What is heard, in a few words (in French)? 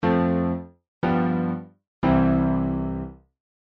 cadence parfaite piano